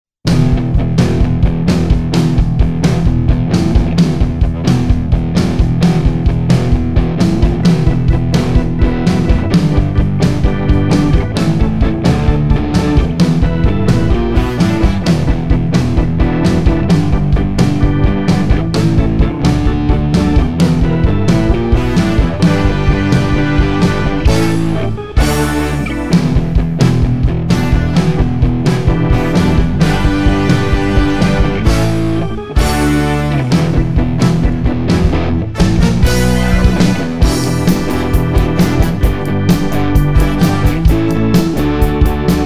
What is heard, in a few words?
Motorist Instrumental